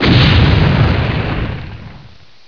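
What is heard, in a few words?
short explosion; Quake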